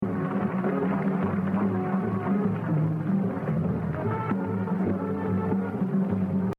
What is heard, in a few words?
Exercise 7, Jazz Drumming - 76%; Gene Krupa; The Evolution of Jazz Drumming (Gottlieb)